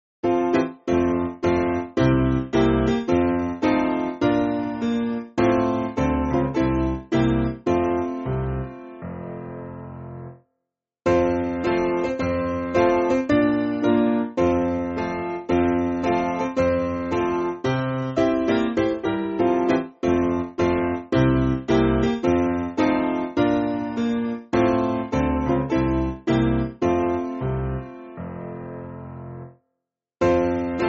Piano, Music Backing Files for Churches

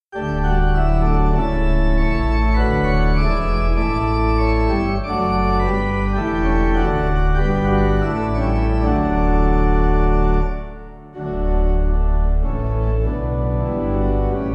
Come Now Everlasting, Sharon, Pipe

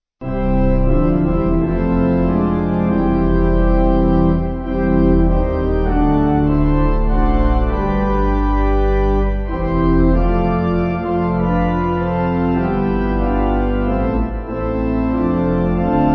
St Beuno Pipe

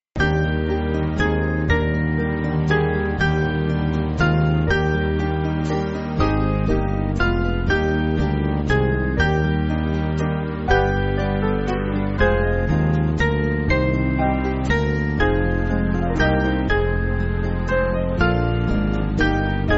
Band; Dost Thou In A Manger; Mauburn

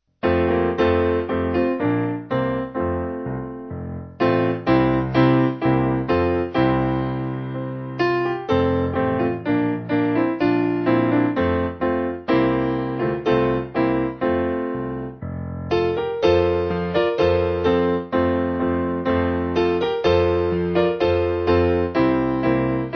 Revive Thy Work, Piano; Simple Piano; Doane

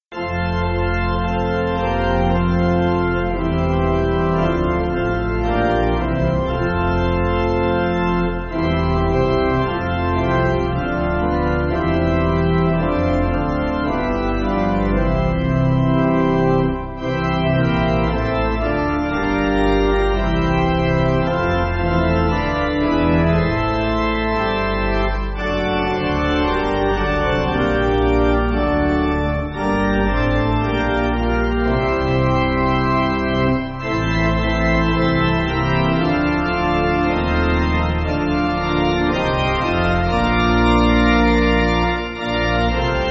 Pipe, Pipe Organ, Backing Files for Churches

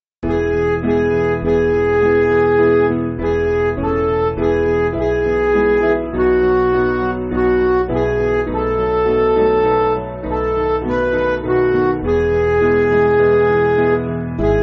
Midi

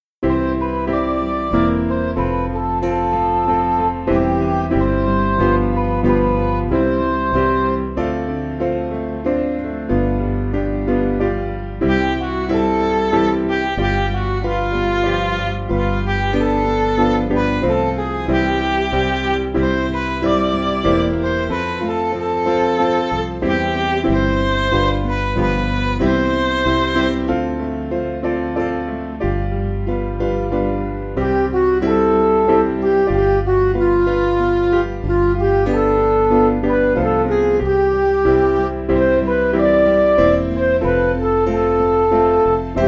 Do Not Yield Thy Way, Midi

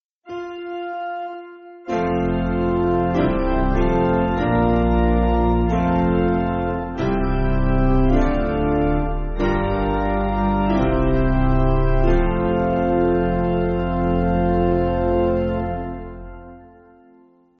Amen 3fold, Chinese, Piano; 1/F Threefold (Traditional); Basic Piano & Organ (CM)

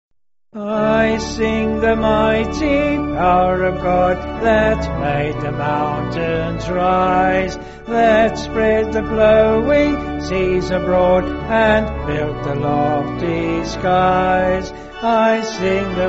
D, Piano, Band, Pipe Organ; 3/D Vocals and Organ; Sung Lyrics

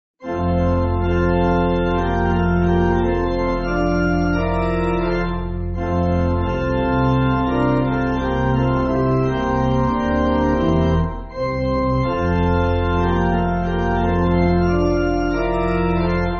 Pipe, church music; How Glad We Are; Narivony

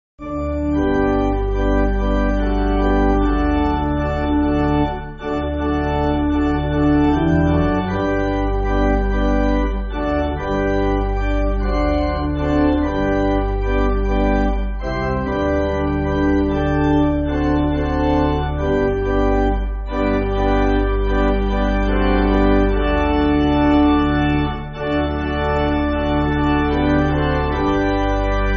Pipe, church music; I Say Goodbye; Pilgrims

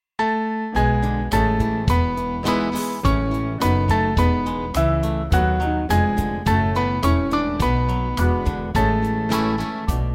Strengthen For Service, Ach Gott

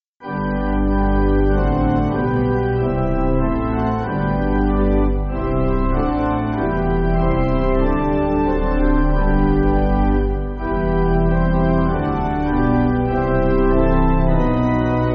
Pipe Organ, Backing Files for Churches

